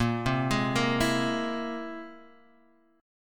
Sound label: C Augmented 7th Bb